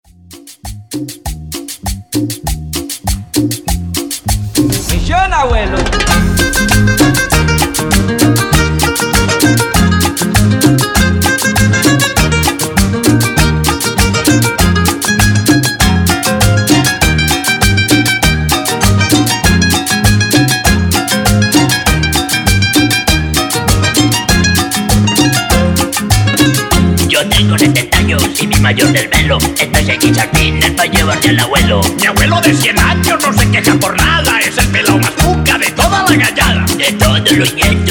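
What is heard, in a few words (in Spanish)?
Extended Cumbia Colombiana OLD, Bpm 99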